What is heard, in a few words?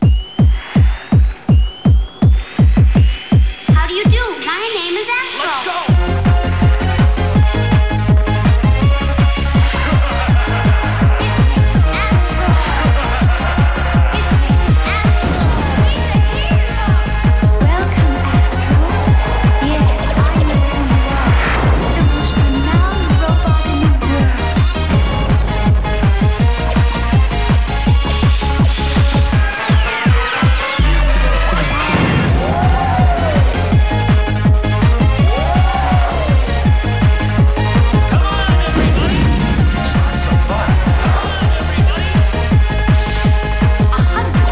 techno